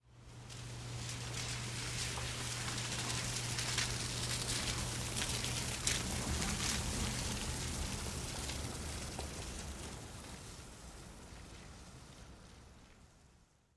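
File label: Car By Slow, close up On Wet Pavement.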